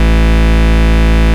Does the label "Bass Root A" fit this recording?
Yes